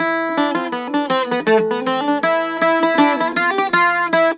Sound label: Celtic Flavours; USB Soundscan vol.42; AKAI